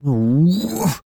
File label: Man Frustrated; Zomboid; Lifestyle